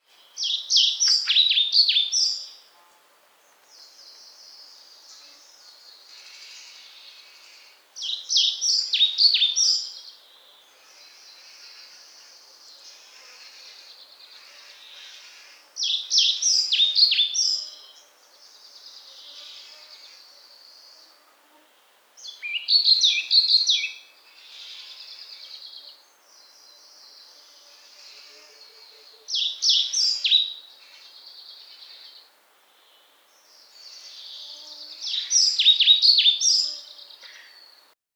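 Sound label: muholovka pestrushka golos, pied flycatcher voice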